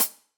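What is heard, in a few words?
Sab Closed Hat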